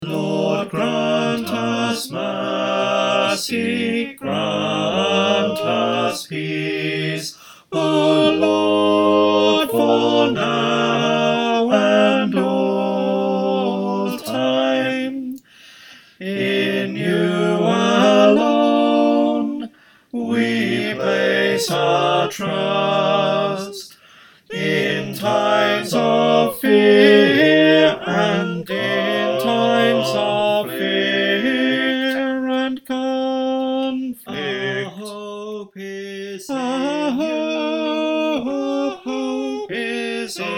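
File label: tenor, choir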